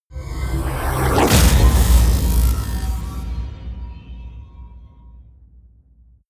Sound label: mechanic robotic whoosh